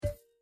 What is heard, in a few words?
Reel Stop; Holy Goat